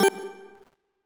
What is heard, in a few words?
CURSOR